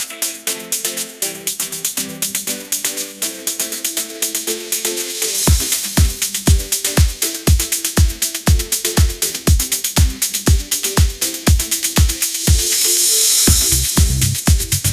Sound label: bright pop energy hook; ai